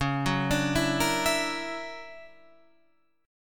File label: D Augmented Major 9th C sharp, guitar